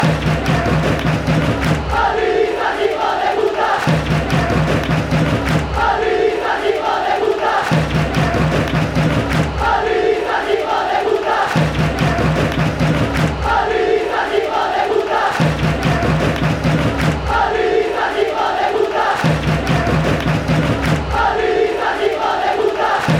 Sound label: hijos de pta, fan chants; madridistas